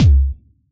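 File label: bass drum Hard Distortion, Yamaha DJX; 155 DJX Kit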